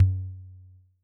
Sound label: Tom